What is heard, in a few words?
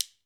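GHANA shaker, African Perc; E-MU Formula 4000 Series Vol. 4 – Earth Tones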